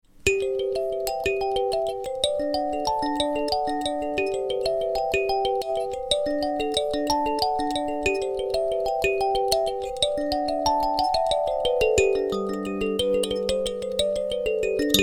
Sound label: Hokema B11